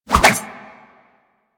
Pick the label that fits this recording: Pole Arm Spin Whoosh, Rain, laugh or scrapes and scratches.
Pole Arm Spin Whoosh